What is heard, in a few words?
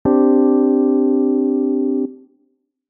csus2